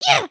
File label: headbang; emotes